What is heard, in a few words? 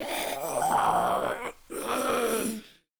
idle, zombie; DayZ-Epoch; dayz sfx